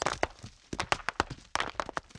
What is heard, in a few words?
gravel, environment; Tribes2